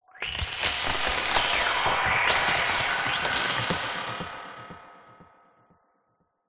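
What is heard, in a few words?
warped rhythmic phased delayed stutters; sound design; zapsplat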